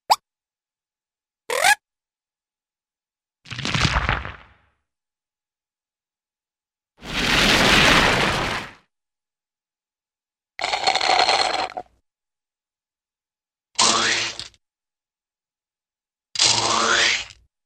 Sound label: Quick High-pitched Zip & Kazoo-type Razz Slurps Zings; Hollywood Edge Cartoon Trax